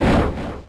Throat Chop; pokemon reborn e19 2022

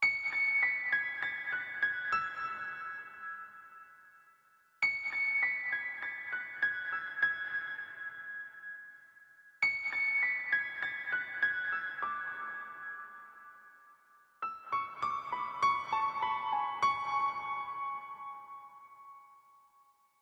Fill

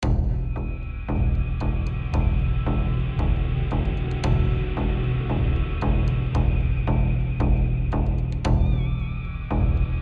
Tension High Chunk

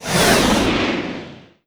Jetcar Passby

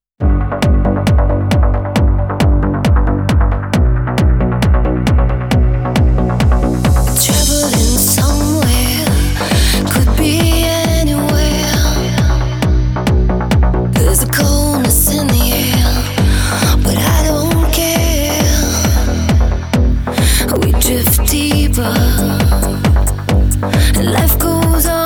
Sweeping Strings; Dance Dance Revolution Festival Strike

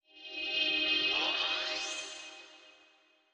Forest Level; children of morta

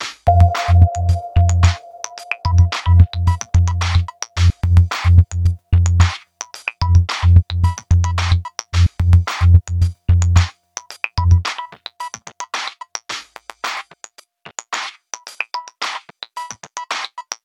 Mission reading loop

